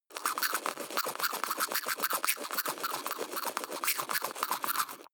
teeth brush dry closed front

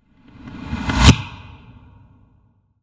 reverse suck in short reverb

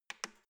embedded button press quick

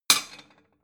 plate small shelve slide hard

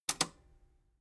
metal button robust spring release disable

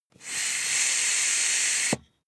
air pump ball hard

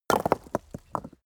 stone drop clack

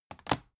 dvd case put down

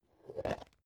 plastic bowl small open rough slow

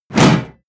hit armor metal

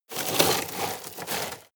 shovel dig

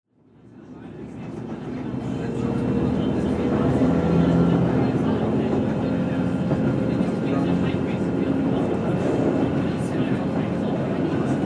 inside a train in uk

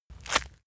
leather impact mud hard squeeze

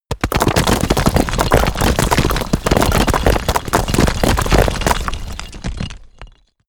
stone drop down sequence

